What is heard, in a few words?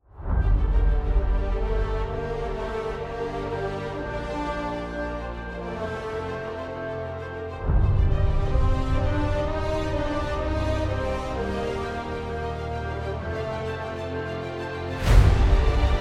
Epic Theme Stage Layered